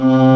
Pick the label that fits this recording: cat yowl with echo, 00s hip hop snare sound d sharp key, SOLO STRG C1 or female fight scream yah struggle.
SOLO STRG C1